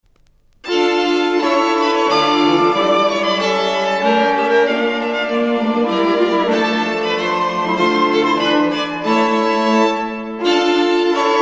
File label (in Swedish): polska; stensele